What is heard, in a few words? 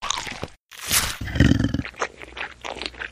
feed; kak priruchit drakona; vysizhivat yajtsa